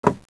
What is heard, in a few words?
door lock, automoveis